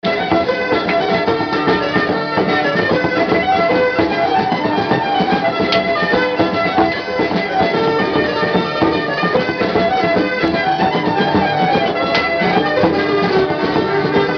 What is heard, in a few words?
irish scottish; music elements; grooves